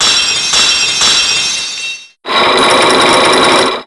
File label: lock end; PsycheLock